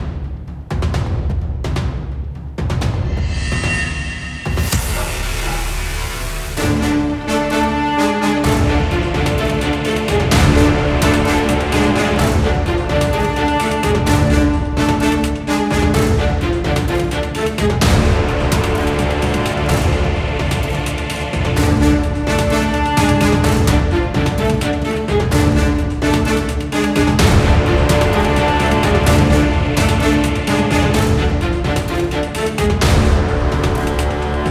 tension; by burak sound bank; tension gerilim vol 39

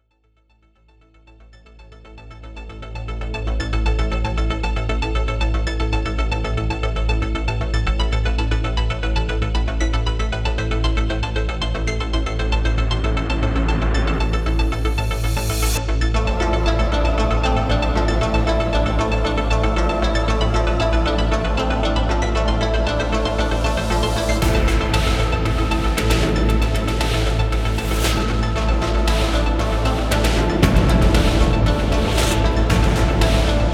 tension; By Burak Sound Bank; tension gerilim vol 22

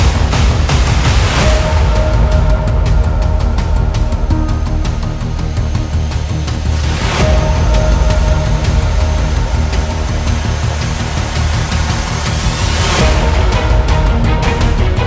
tension; By Burak Sound Bank; tension gerilim vol 23

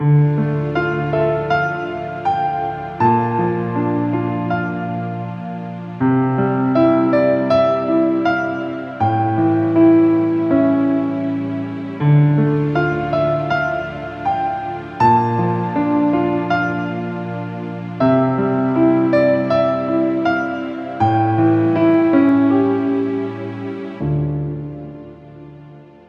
dramatic; By Burak Sound Bank

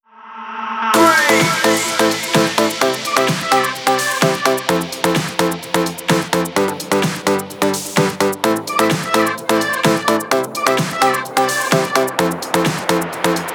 house electronic techno; By Burak Sound Bank

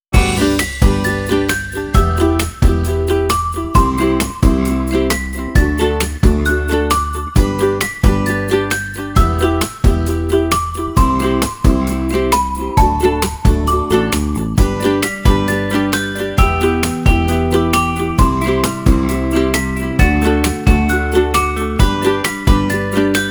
sweetness whistle; by burak sound bank; cheerful vol 3

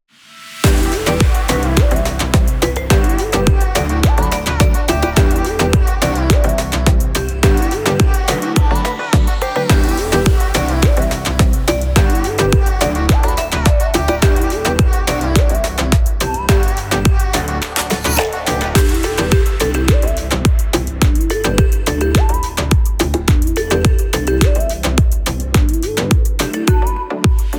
reggaeton tropical bells trap; By Burak Sound Bank; reggaeton tema peketleri vol 2

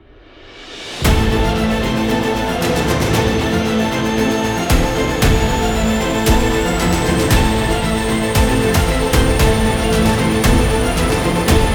dramatic guitar; by burak sound bank; dramatic dram vol 2

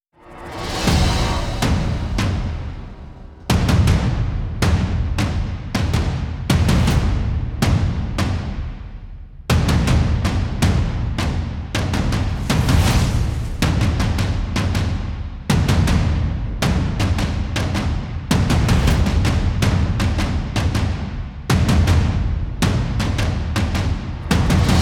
tension drum fx; gerilim; sadece davul; By Burak Sound Bank; tension gerilim vol 10

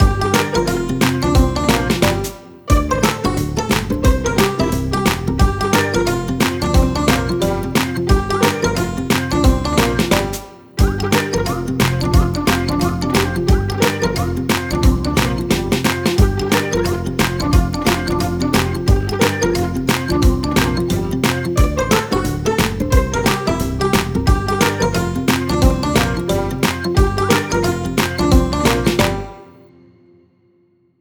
cheerful banjo; by burak sound bank; cheerful vol 20

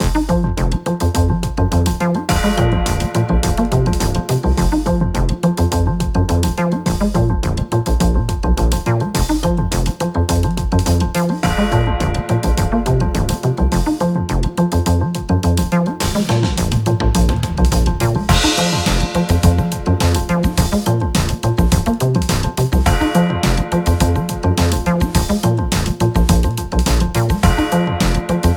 80s nostrings; by burak sound bank; tension gerilim vol 53

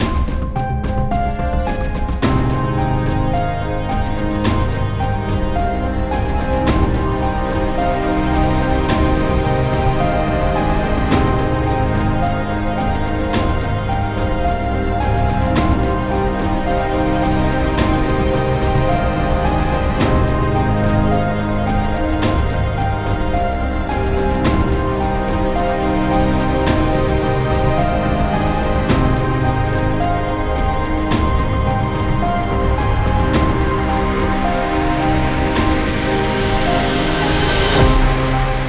tension; by burak sound bank; tension gerilim vol 45

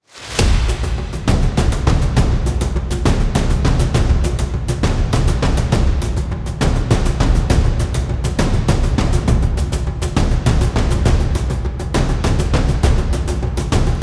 tensionproject drumcomp; by burak sound bank; tension gerilim vol 6

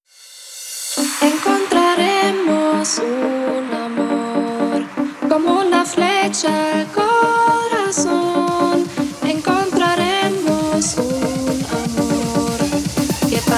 ispanyolca uzun versiyon house remix

